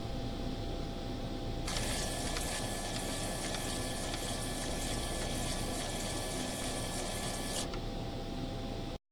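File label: pc04 paper tape reader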